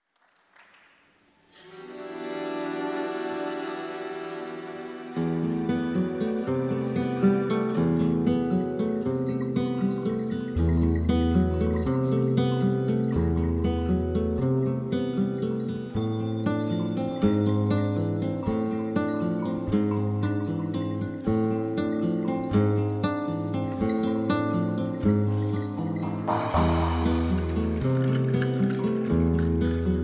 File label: Bells